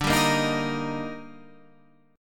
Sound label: D sharp Major, Guitar; strummed; JGuitar